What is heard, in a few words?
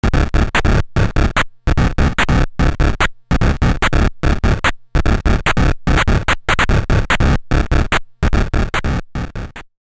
fill; Synthcart